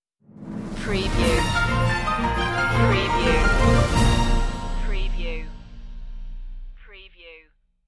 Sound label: news stinger